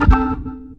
ORG RIF; E-Lab; X Static Goldmine 2; akai

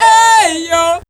VB MEL, Voice Spectral

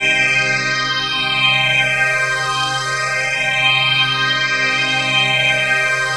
PHASE PAD, ATMO PADS; Best Service; XX Large

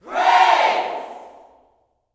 PRAISE, Vocal Planet Gospel YELL SHOUT; Spectrasonics; Vocal Planet Vol.1 Gospel; akai

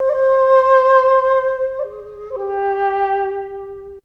FLUTE

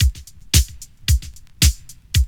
LOOP; E-Lab; X Static Goldmine 2

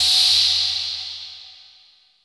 IND

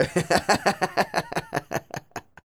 LAUGH, Vocal Jazz Fx

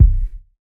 BDRUM; E-Lab; X Static Goldmine 2; akai

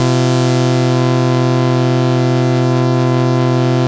Atmo Pads; Best Service; XX Large

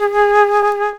D.FLUTE; E-Lab; X Static Goldmine 2; akai

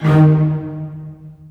MARC D#3

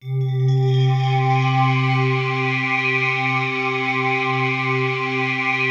PAD; Best Service; XX Large; Pads

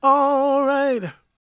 GOSP MALE, Vocal Planet Gospel

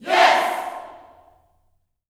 "Yes", Vocal Gospel yell shout; Spectrasonics; Vocal Planet Vol.1 Gospel; akai

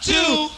VOC

